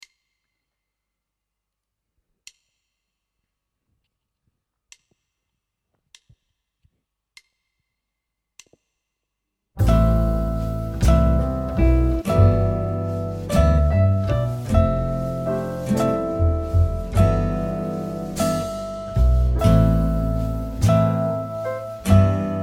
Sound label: Hello 49 harp